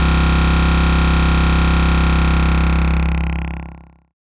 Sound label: Thick Bass; 808